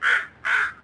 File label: Intro Street; the princess and the frog; Restaurant; Street Crow